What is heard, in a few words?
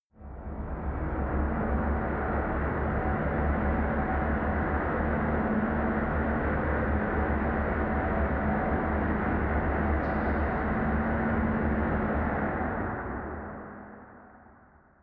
machine room